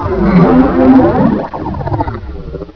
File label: die; portal house